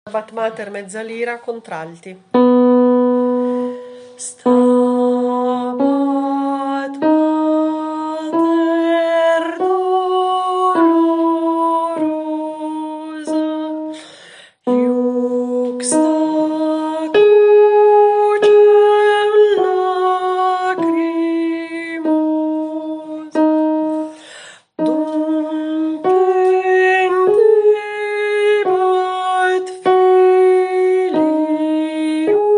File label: Stabat Mater contralto; Mezzalira